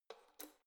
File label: letterbox metal turning key